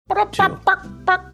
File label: attempt at recreating marsupial gurgle sound like clucking chicken